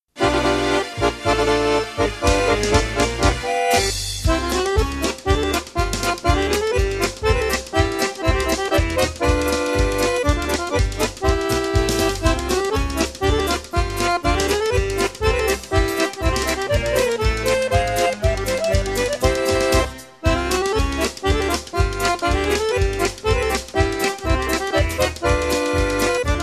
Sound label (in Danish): jubilaeumspolka